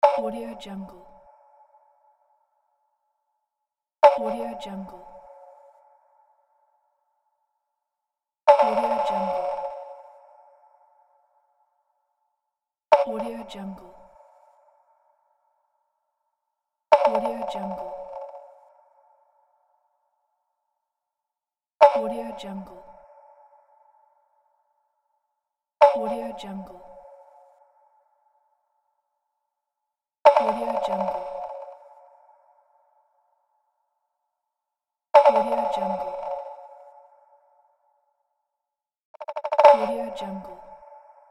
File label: percussion drop effect